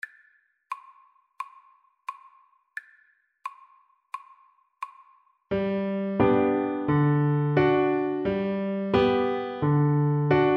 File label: Recorder